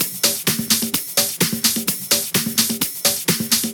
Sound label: House loops 128BPM; Vengeance Samples